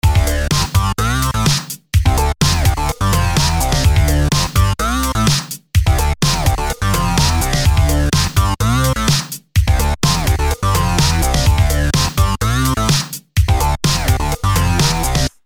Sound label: Avenger Synthwave; Flipside II